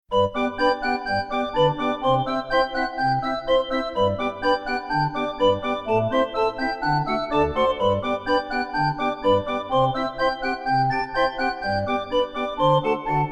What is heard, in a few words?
Pipe Organ